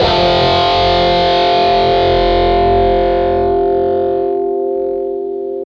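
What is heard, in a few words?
GUI MET2 F3, metal guitar; Sound & Vision; Gigapack I CD 2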